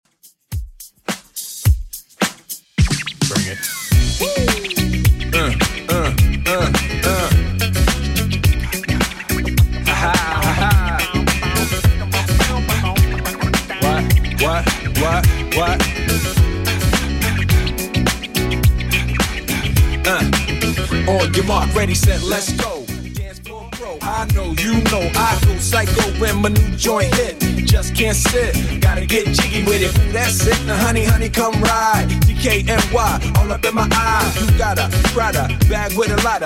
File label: 90s Redrum 106bpm